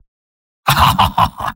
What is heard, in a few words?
spy laugh short; vo; tf2; mvm